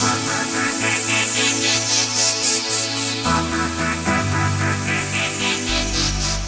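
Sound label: Pad Tremolo chords, Drum & Bass Pads; Sonic Foundry (Sony Creative Software); Knutrix Drum & Bass; Keys Pads 1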